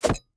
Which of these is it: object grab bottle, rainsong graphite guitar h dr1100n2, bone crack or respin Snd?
object grab bottle